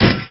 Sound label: fall; openffa; droid